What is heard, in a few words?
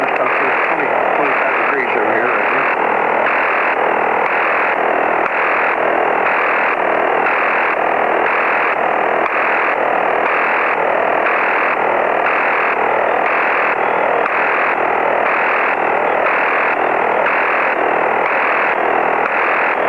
noise